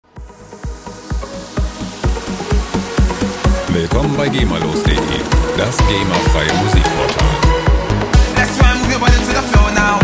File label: pizzicato